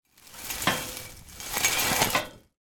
industrial metal gate open drag field grass underneath